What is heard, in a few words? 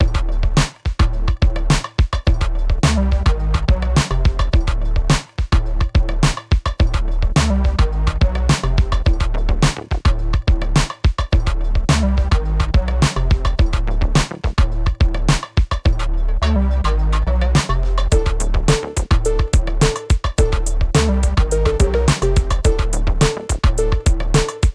dungeon tunnel; starbites